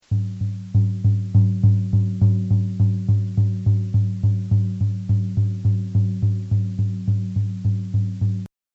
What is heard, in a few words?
drumming